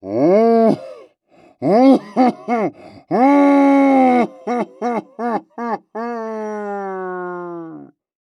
Cartoon Human Giant Laugh; AdobeCC

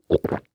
Human Swallow

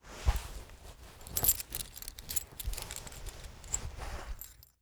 Foley Coin Remove Coins From Pocket

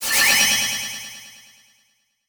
Transition Delay Medium

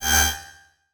Transition Whoosh Alarm

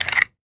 Weapon Gun Pistol 9mm Glock Clip Insertion; AdobeCC

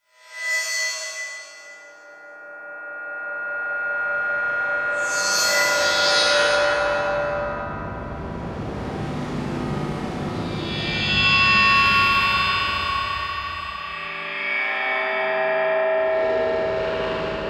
Electronic Whoosh Reverse Feedback Digital

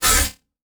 Multimedia Internet CD-Rom Flash Wipe Short

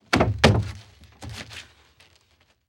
Impact Firewood Grab And Stack

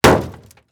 Impact Metal Bang; Impacts

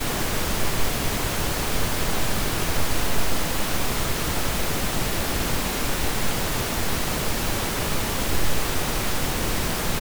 pink noise

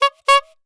Cartoon Horn Small Hand Horn Honking; AdobeCC

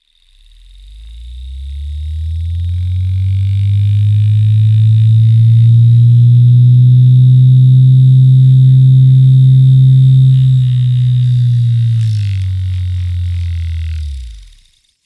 Industry Motor Servo Spinning Long

